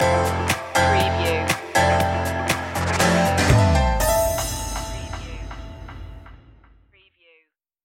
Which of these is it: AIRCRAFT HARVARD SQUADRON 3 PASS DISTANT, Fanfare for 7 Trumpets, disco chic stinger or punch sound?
disco chic stinger